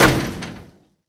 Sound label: Dunk